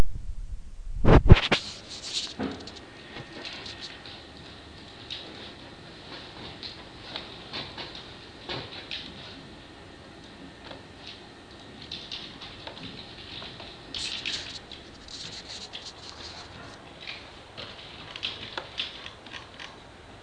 work typing mousing no talking

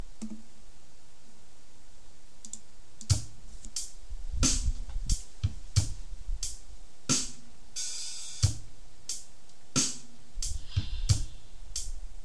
original bass riff minor

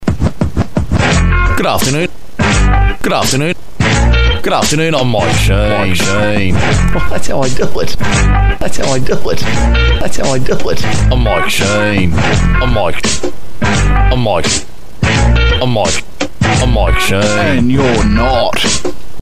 rap

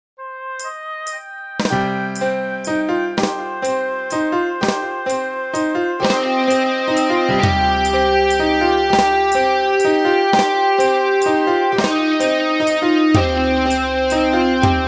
rock rap beat; game of thrones inspired

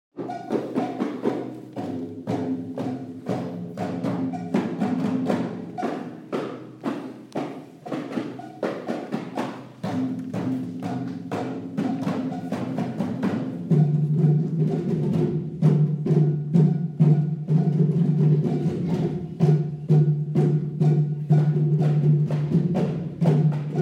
play the drums

